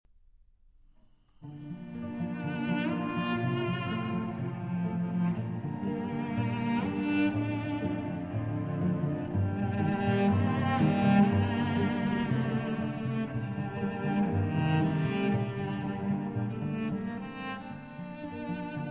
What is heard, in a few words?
4 Romantic Pieces I. Allegro; Op. 75, B. 150; Dvorak; Allegro Moderato